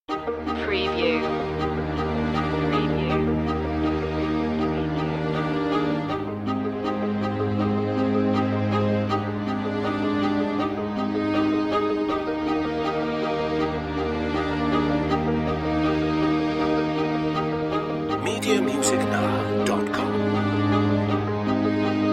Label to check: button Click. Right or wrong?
Wrong